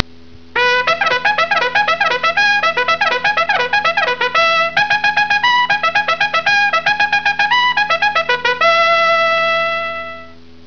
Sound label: Trumpet Call; psion cd vol1